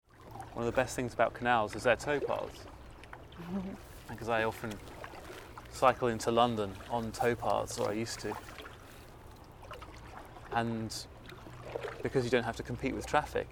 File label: cycle superhighway; kayaklibre; 2011 Birmingham